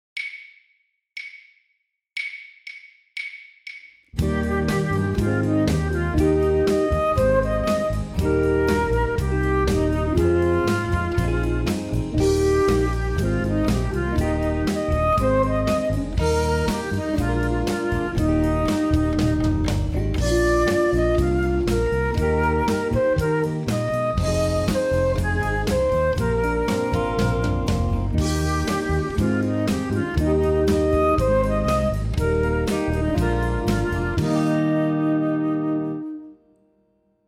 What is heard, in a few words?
120 bpm